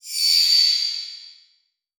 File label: Beep Whoosh; Multimedia Internet CD-Rom Flash